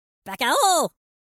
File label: ow; Minion